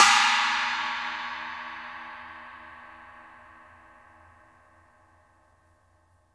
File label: PANG, cymbals; Sampleheads; New York City Drumworks VOL-1